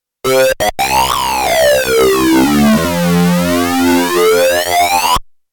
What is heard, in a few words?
Wide Dist LD; KOPRO+